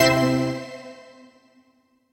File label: intro Worlds; todee and todpee